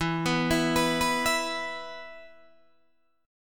E 5th, Guitar, DADGAD

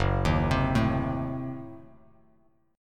Gb Diminished 7th, down, Bass Standard; arpeggiated; JGuitar

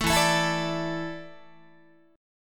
E 7th Suspended 4th, Guitar; E7sus4; strummed

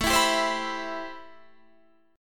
C Major 7th, A, guitar chord; Cmaj7/A strummed; JGuitar

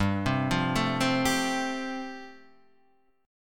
G 9th Suspended 4th, Guitar Open D

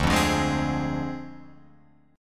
D Minor 7th Flat 5th, Guitar Open F; Dm7b5; strummed

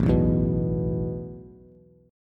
A Suspended 2nd A Bass; Asus2 strummed

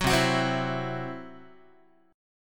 C Major Flat 5th, Guitar; strummed

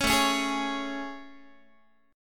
A Major 7th A, Guitar; AM7 strummed

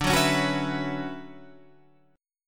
D Major 13th D down Guitar Half Step Down

